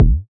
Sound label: short kick f sharp key, Loudest frequency: 128Hz